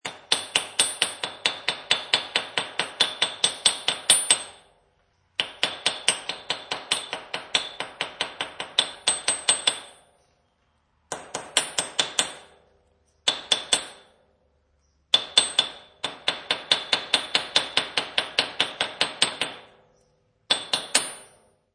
Hammer Metal Floor, Hammering a piece of metal on hard concrete floor; High pitched metal clanking, dinks, clinks.